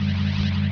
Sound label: recharge; skrap; PC Gamer 3.7